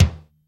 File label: BRITE KIK; Hollywood Edge; The Big Bad Beatmachine